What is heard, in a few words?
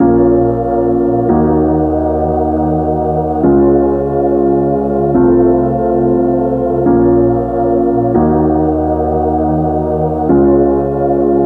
Bb Minor Prog